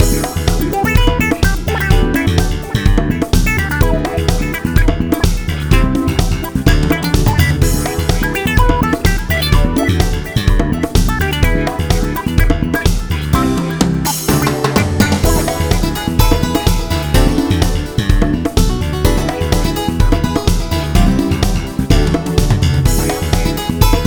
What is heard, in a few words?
race music